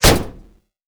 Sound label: hit, damage; DCS